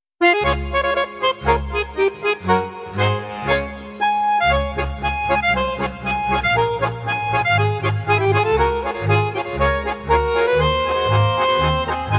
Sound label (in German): wetzstein marsch